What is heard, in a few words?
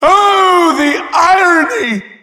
headbomb hit